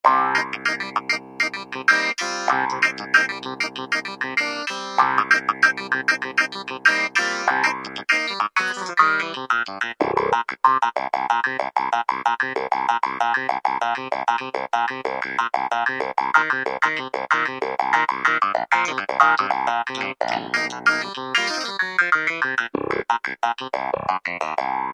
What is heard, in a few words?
Wah Clav, Kurzweil K2000; KEYBOARD; Kurzweil K2000 Contemporary ROM; Contemporary ROM Programs and Demos diskette